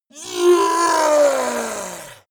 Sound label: yeti attack shout aggressive command; schreie